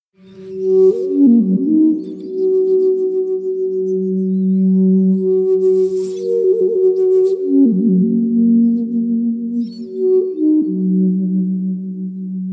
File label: rainbow anasazi flute